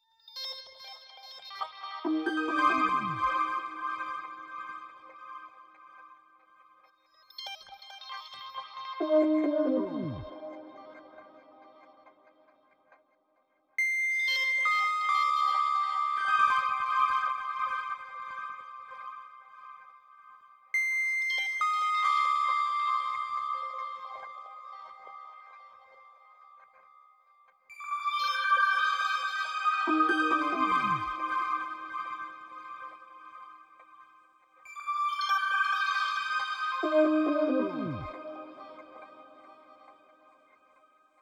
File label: 138 BPM C Min Accent; Trap; Melody Loops; Loop Stems; Cymatics; Nightmares; Devil